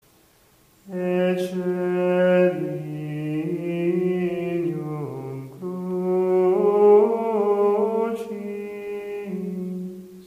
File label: Ecce Lignum Crucis; Good Friday